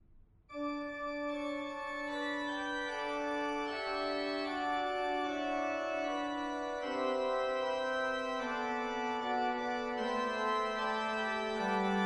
forspil; Alle mine kilder skal være hos dig

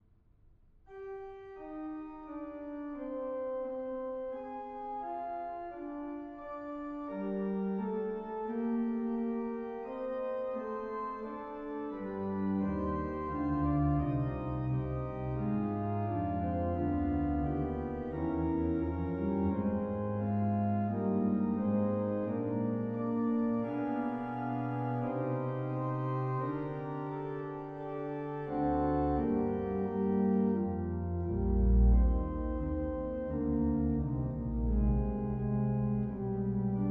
forspil; At sige verden ret farvel

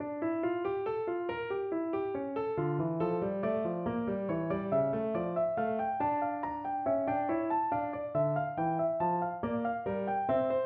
invention i d-moll

